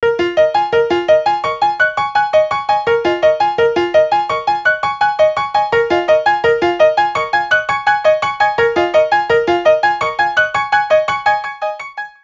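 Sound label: Intensive, ringtone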